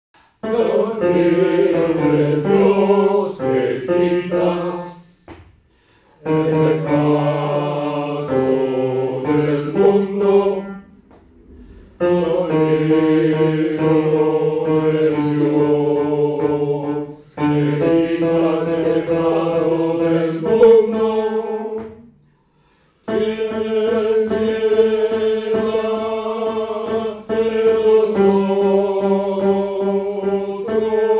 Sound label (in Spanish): Cordero De Dios Bass